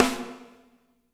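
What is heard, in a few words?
PIC SNR, piccolo snare; Spectrasonics; Burning Grooves CD2; PICC. BALLAD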